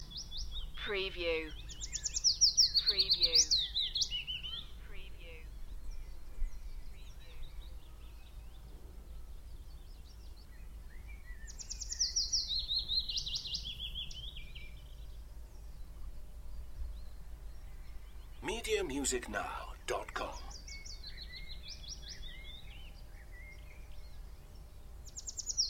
Spring birds song with machine noise in the background; beanstalk audio